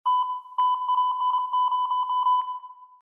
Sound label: signal